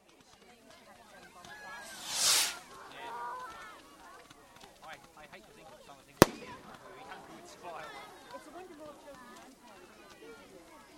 Fireworks and Crowd 1, Fire works with crowd reaction